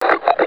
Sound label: combine radio off; soldier common